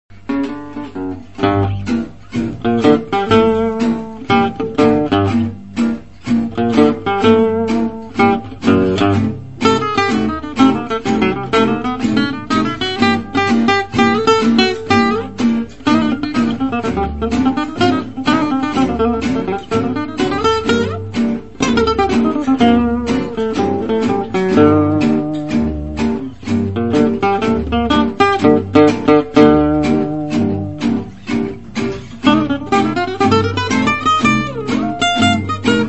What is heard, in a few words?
minor blues, playback